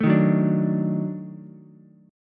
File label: D sharp Minor Major 7th Flat 5th D sharp Ukulele; strummed